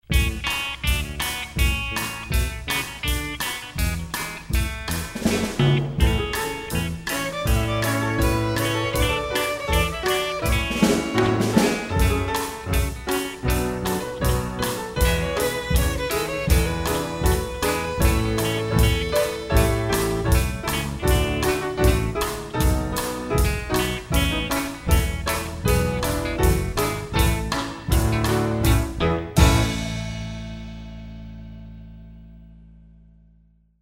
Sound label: orch